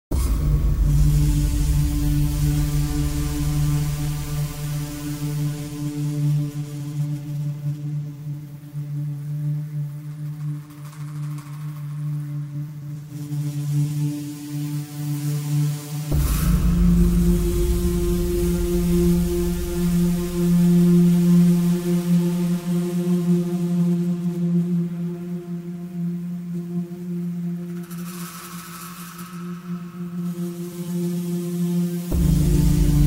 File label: Pizzaplex Ambiance; Five Nights at Freddy's; FNAF Security Breach